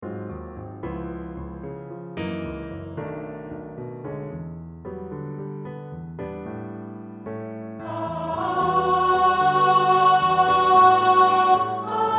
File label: Alto; Kyrie